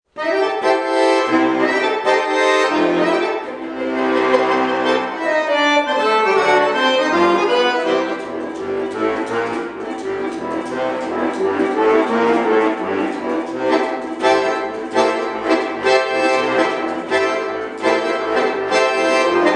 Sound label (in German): Akkordeon-Boogie